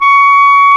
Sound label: CLAR, Clar Jazz; clarinet; Roland L-CDX-03 Disk 1; Clarinets